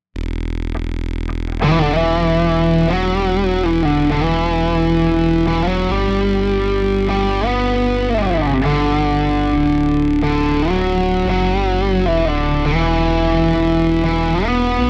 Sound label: synth hold